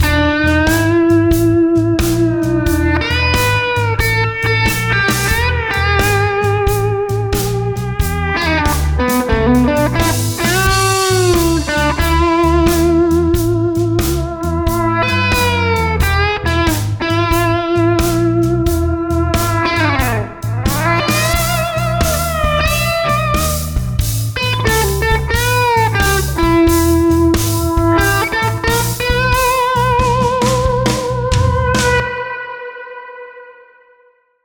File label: Gtr Solo Reverse